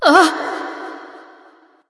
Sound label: breath light; smite; voicepack; hel